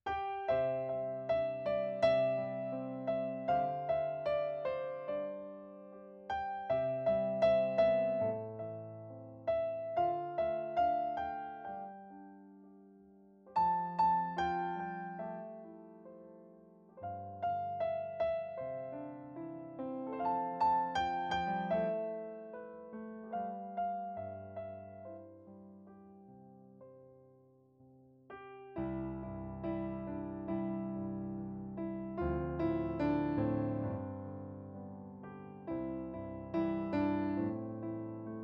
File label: instrumental